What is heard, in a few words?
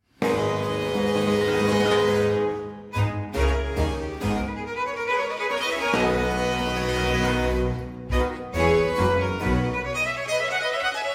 sonata in a minor; sonate da camera 1670; sonata a5 6